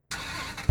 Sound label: startup, vehicles; viper